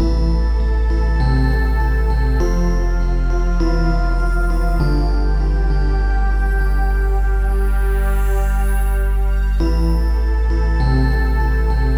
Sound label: metaphysical loop; fruityloops